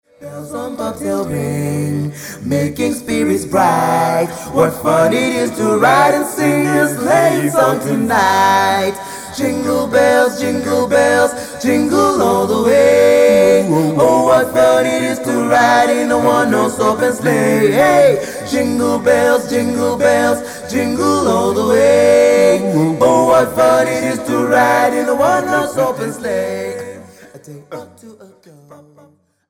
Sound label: jingle bells